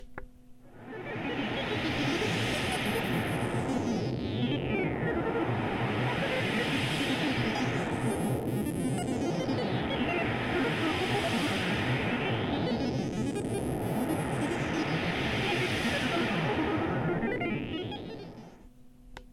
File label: noise